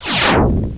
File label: super weapon fire